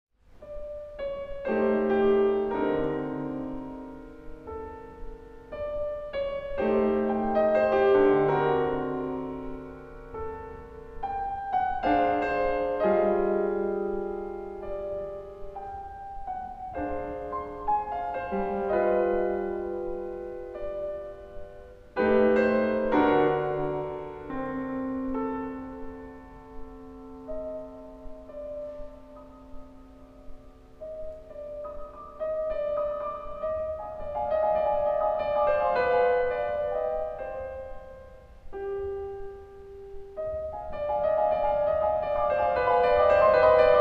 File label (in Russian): Прелюдия g-moll